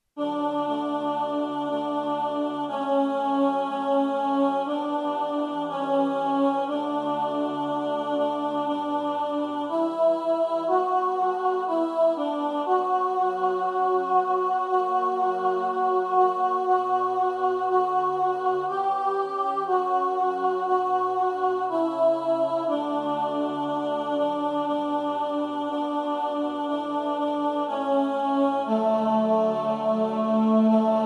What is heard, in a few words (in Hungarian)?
alt, Atyám a Te kezedbe